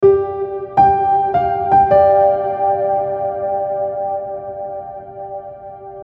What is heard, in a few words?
DreamGrand